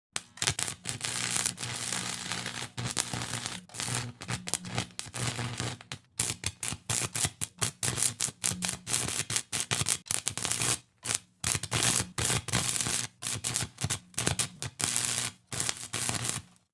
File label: arc weld electrical sparks; sonniss gdc